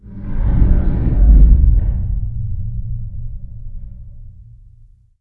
low bang

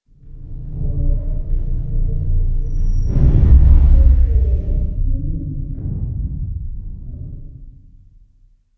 low bang

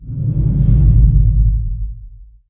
low bang

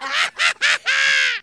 cartoon croaking bird laughter

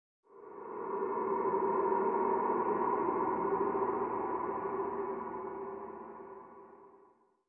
tunnel longer

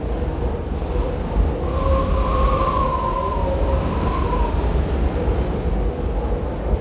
wind big

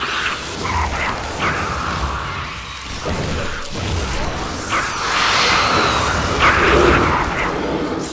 stir up loop

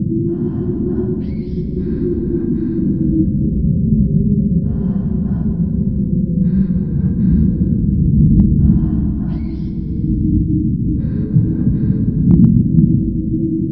cavern alien ambience

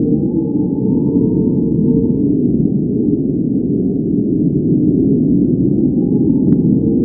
wind low

